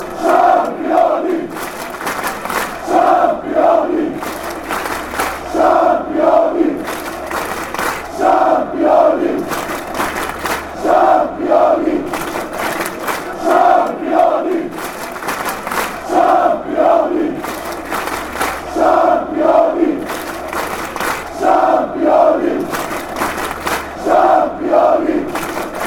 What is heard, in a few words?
slovenia chant